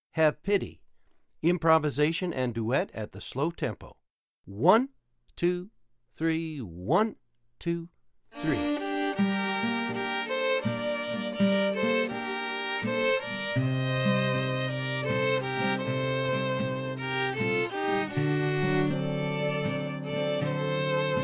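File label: Have Pity improv duet slow; Jeremy Cohen's Make Your Fiddle Swing! Part III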